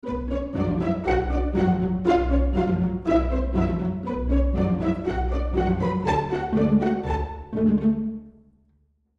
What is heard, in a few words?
Ensemble, pizz; Hall of the Mountain King; Cinesamples